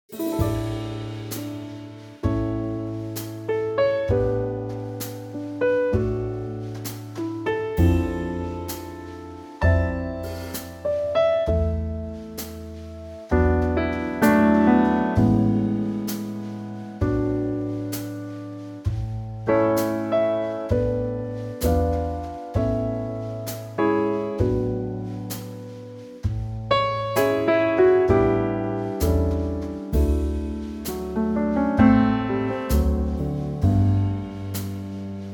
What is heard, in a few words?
A Trio